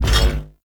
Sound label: Bash Cast; Warframe; Hoplite